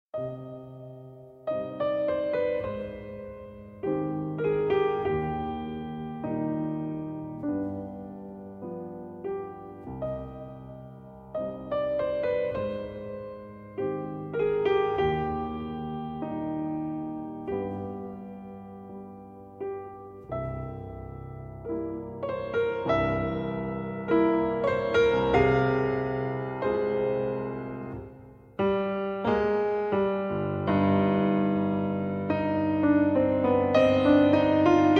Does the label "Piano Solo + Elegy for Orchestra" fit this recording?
Yes